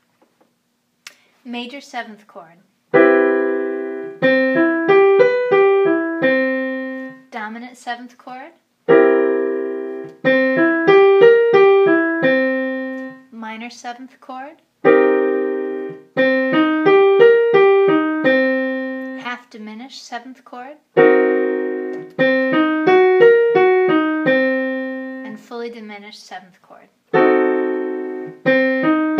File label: 7th chords